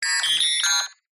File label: science fiction robot android beep chirp classic